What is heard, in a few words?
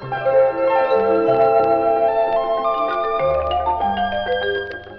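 strings, phonky loops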